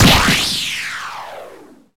punch kick hit; HeavenStudio; karateman

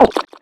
gulp; HeavenStudio; munchy Monk

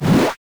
open; HeavenStudio; nail Carpenter

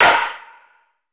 hit; HeavenStudio; spaceball

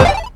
long Shoot; HeavenStudio; spaceball

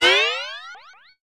crouch charge; HeavenStudio; airboarder

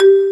Xylophone; HeavenStudio; workingDough

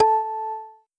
pin; HeavenStudio; launch Party

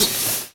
gate; HeavenStudio; shootEmUp

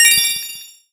skill Star; HeavenStudio

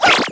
girl toss chair; HeavenStudio; trick Class

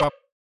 roll prepare B; HeavenStudio; board Meeting

